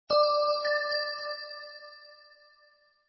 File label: MIDI; SkyBee